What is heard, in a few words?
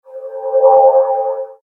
non tonal